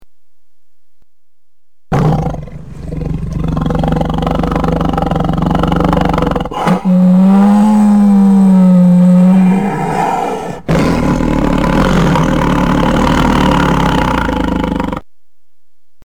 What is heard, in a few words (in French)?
tigre cri